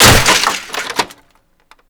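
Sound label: destruction photocopier plastic debris; Explosions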